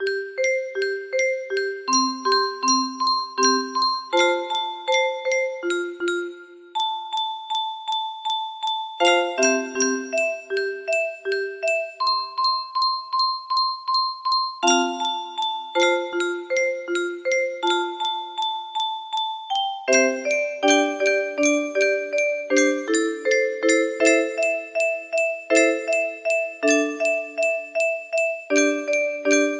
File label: in a minor key, music box melody